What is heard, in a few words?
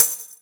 Tamborine, Misc Percussion